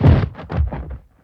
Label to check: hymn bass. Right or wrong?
Wrong